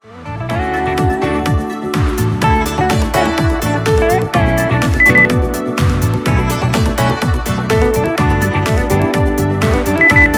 pop

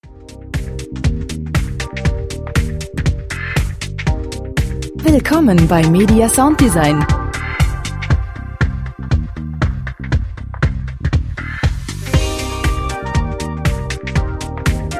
lounge fashiongroove

